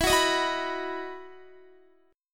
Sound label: E Major 7th Suspended 2nd, Guitar; EM7sus2 strummed